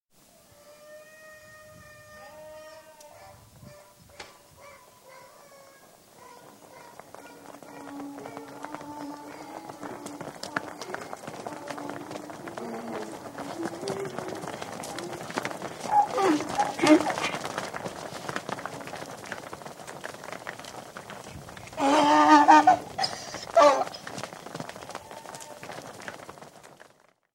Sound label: Thirty donkeys passing in yard; Animals; BBC